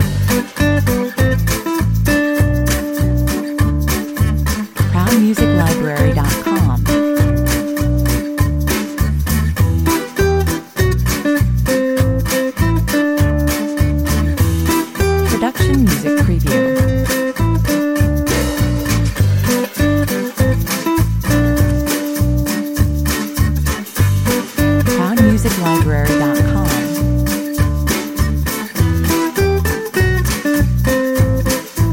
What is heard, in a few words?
guitar family 100 bpm